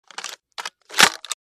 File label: Sniper rifle manual reload